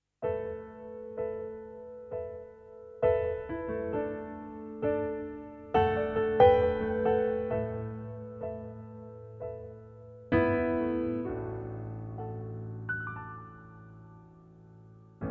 Piano

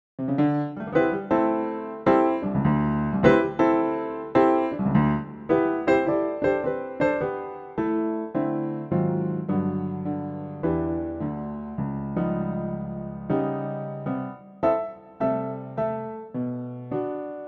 G piano

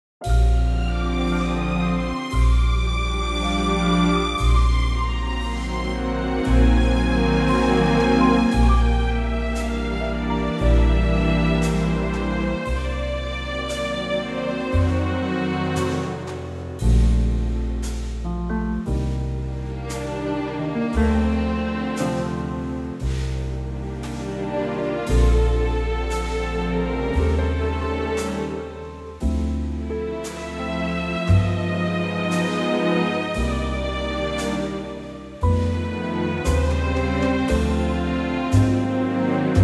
Bb orchestra

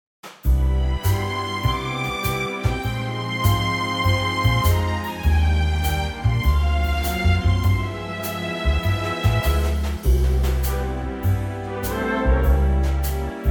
C orchestra

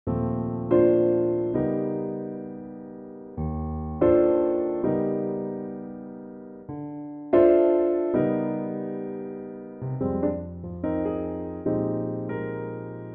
Eb piano

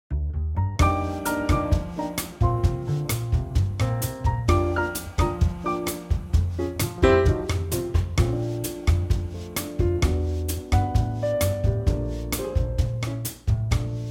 Bb Bossa

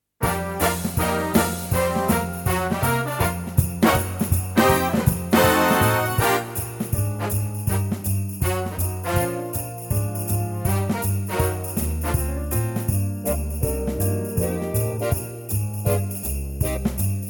Bb live trak

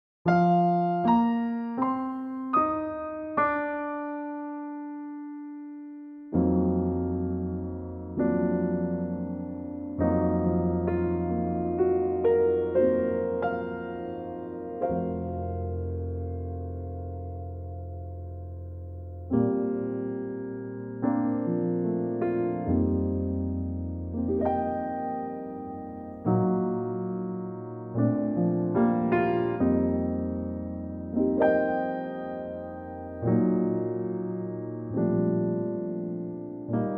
Bb piano

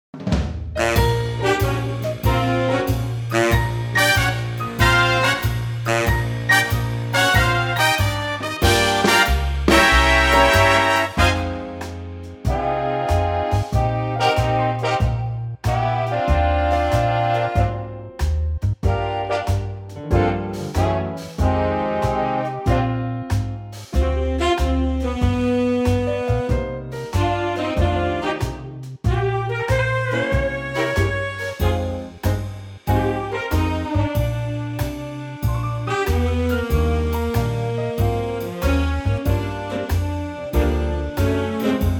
Eb swing

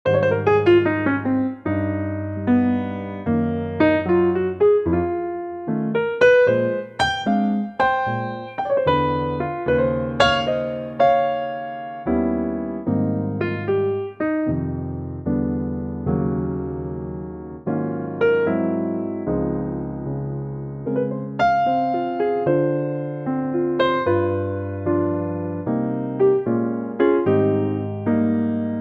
Ab piano trak

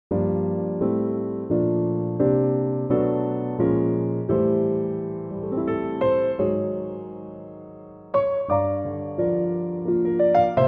F piano

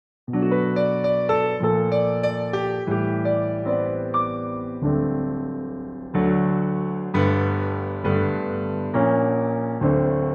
HAPPY BIRTHDAY G piano

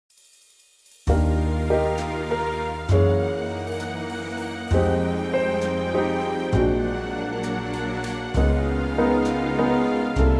Eb orch

